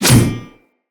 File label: Mace Whoosh; Weapons